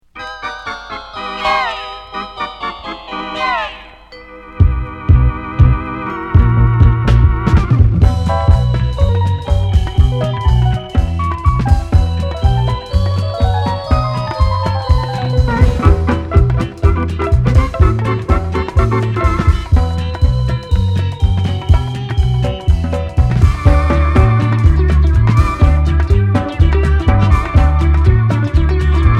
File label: orchestra